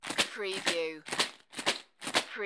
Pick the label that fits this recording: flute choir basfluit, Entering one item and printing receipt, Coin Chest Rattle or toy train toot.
Coin Chest Rattle